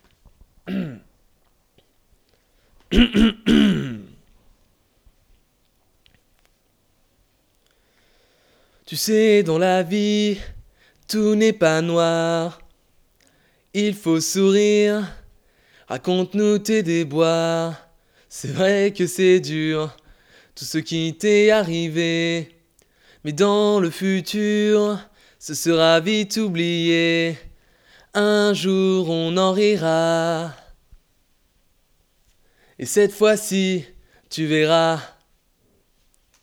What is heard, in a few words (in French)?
Voix principale